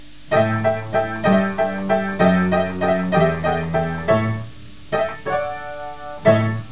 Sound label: piano